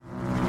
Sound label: laser shot charge